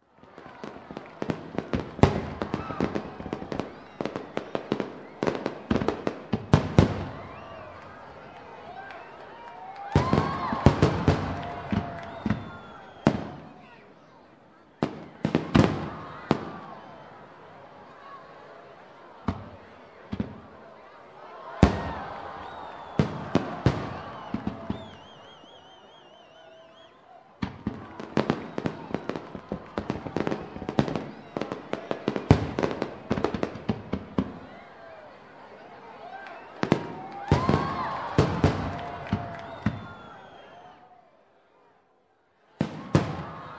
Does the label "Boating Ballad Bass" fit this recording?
No